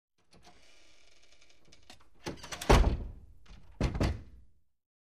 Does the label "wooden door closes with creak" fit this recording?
Yes